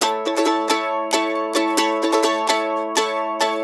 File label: RIFF, Blues Guitar